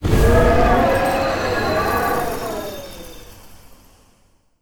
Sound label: Dis Blizzard